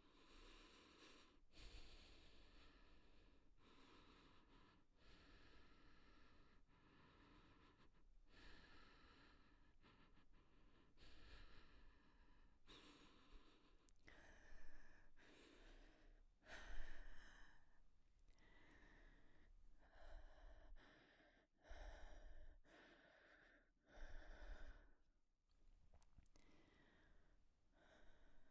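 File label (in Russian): деликатное дыхание женщины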